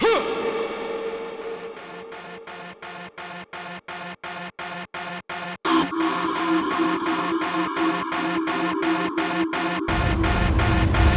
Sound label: Generic Bassline